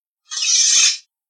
Sword Draw Fast

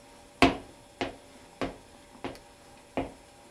Footsteps On Hard Floor

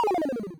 Retro Death

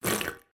Slurp

